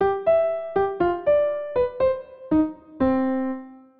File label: Piano; Animal Crossing